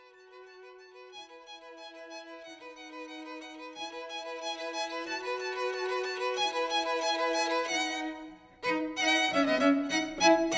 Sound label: Allegro Violin Cello; Vivaldi; Allegro from Spring